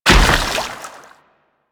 Combined Step Water, Footsteps; Enemies; Warframe

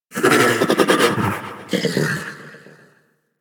Snow Critter Vocal Tranquilized; Warframe; Hunting Critters

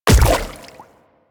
Infested Splitter Step Water, footsteps; Warframe; Grey Strain

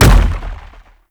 Biped Step, Enemies; Warframe; Man In The Wall

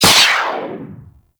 Corpus Modular Skate Dash; Warframe; Corpus Modular Crewman

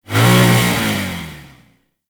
Infested Hammer Rev, Weapons

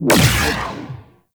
Mega Beam Fire; Warframe; Venus; Venus Flying Spaceman

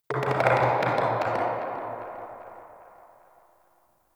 Dark Creak; Ambience; Corrupted Ode

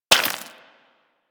Bullet Impact Debris Rock; Warframe; Weapons

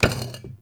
Vase Medium Impact

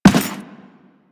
Run Default, Footsteps; Warframe; Teshin

